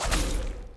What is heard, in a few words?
MOVE; C64 Dreams; Multi-Sound Default